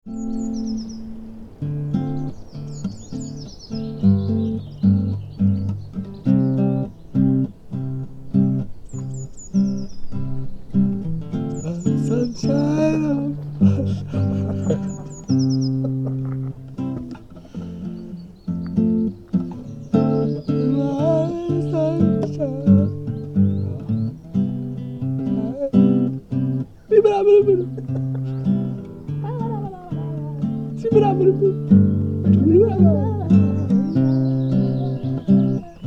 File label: hippy song